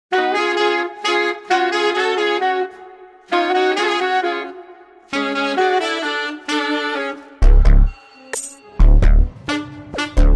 saxophone cover

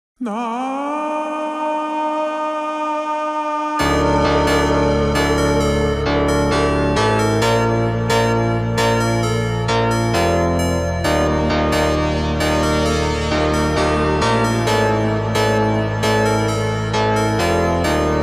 super slowed reverb

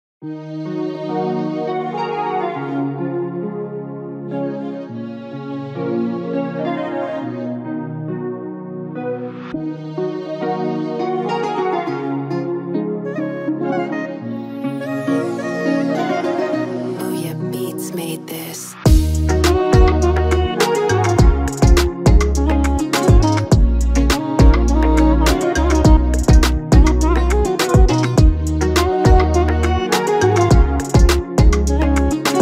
oriental trap beat x balkan hip hop instrumental

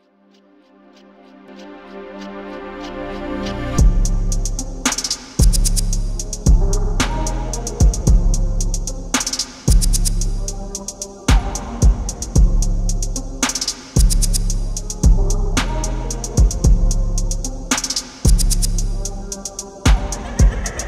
slowed reverb

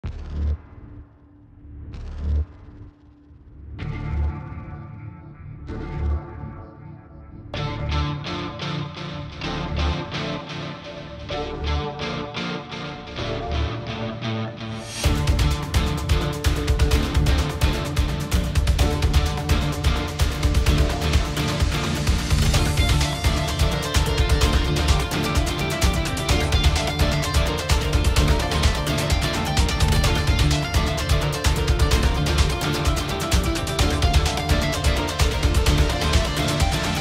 epic rock cover; Sonic; ONE PUNCH MAN

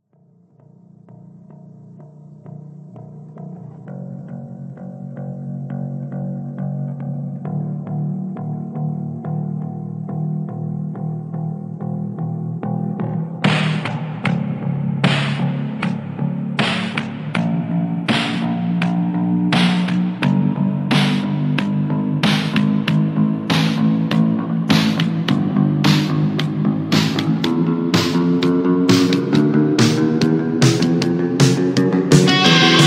hardstyle remix

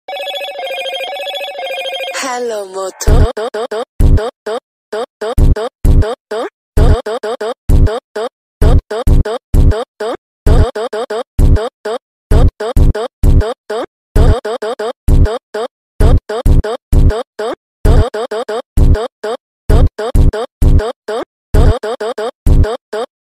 funk

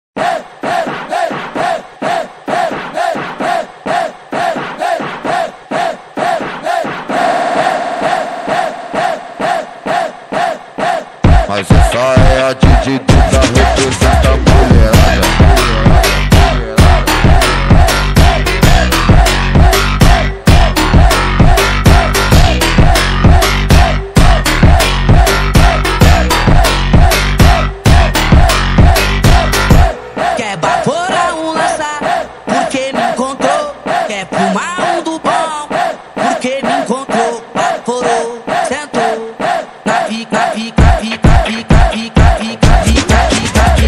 automotivo ritmado

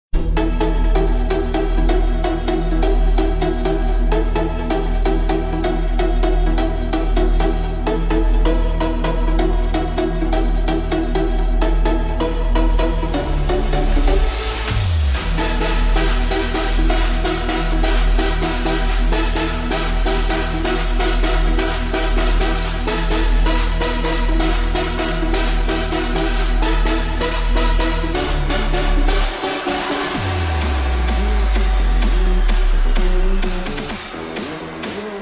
bass boosted

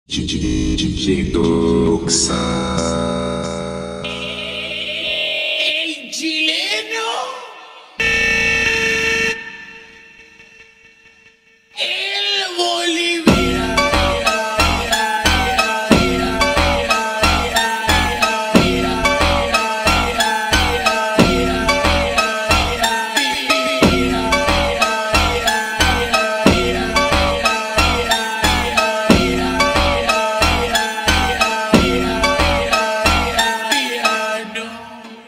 funk slowed

